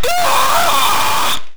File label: Die